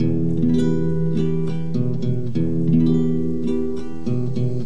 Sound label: guitar; MELODIC LOOPS